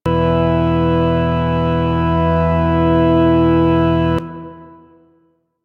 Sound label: Organ E Note; Ambience; Orokin Moon; Warframe